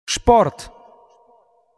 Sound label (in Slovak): jingle, sport